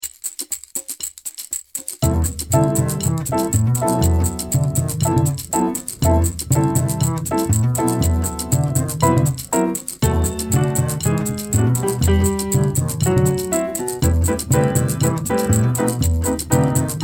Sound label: Music loop